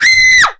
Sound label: SCREAMING; AKAI S-Series CD-ROM Sound Library VOL-8; SFX